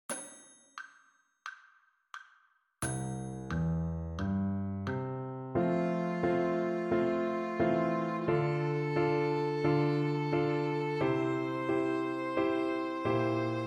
F major arpeggio

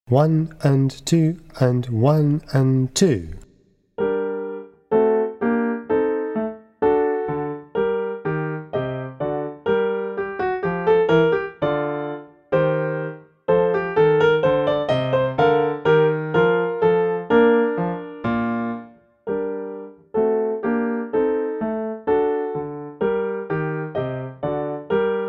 Bourrée

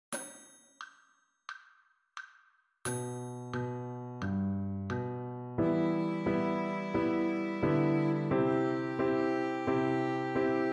E minor arpeggio

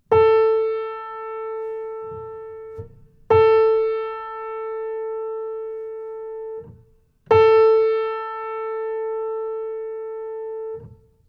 Tuning note A

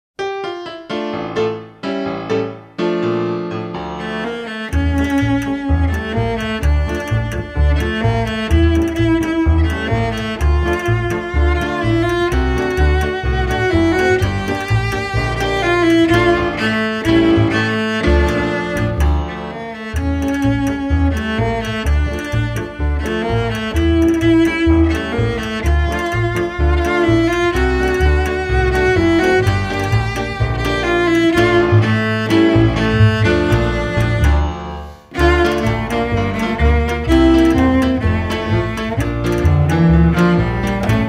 Latin nights

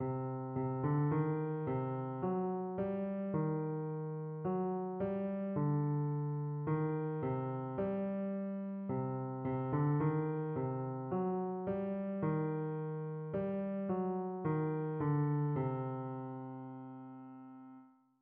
Spooky tune

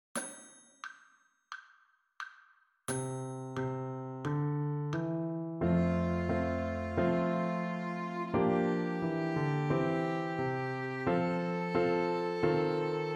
F major scale

